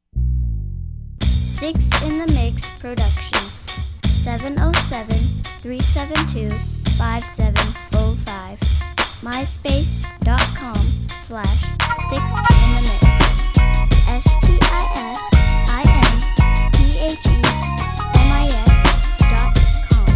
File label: summer funk